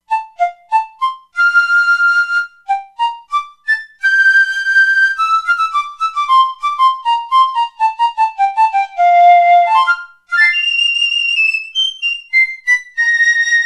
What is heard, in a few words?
Piccolo, E-mu Proteus-2; Factory Presets; PiccoloPeace